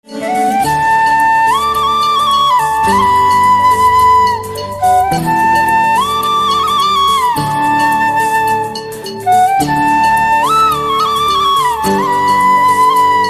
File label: Flute Instrumental Ringtone